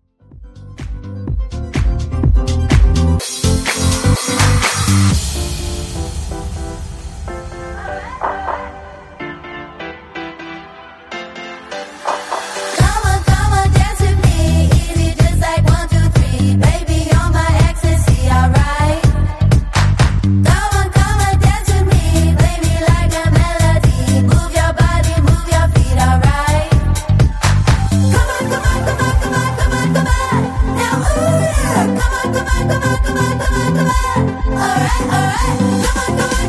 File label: Disco